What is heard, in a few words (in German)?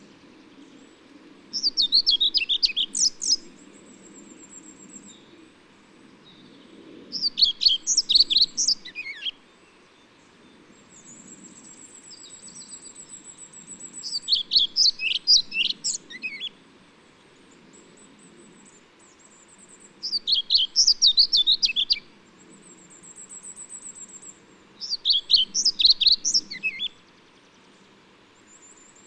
Trauerschnaepper